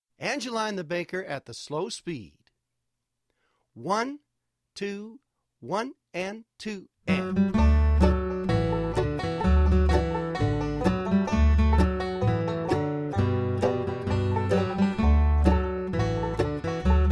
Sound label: playalong G slow; Angeline